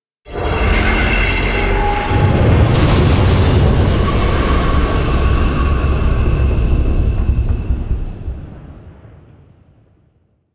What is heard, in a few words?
Solar Flare; Warframe; Quests; The New War